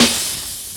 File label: short reverb snare sample b key